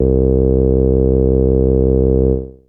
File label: Bass Synths hits and octaves